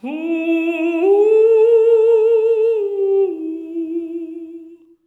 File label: FALSETTO; Spectrasonics; Symphony of Voices